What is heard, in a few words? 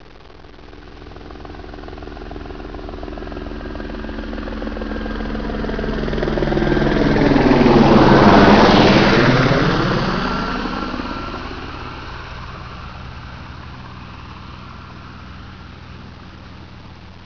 heli pass; cstrike; snowfacility